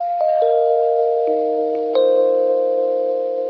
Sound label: front door, Electronic Loops